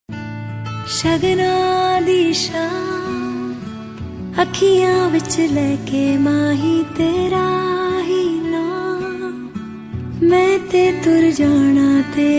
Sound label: panjabi ringtone